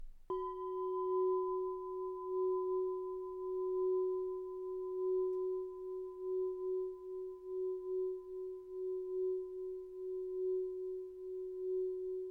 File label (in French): Cloche tubulaire 25mm F4